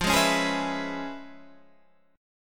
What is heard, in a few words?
C sharp 7th Sharp 9th F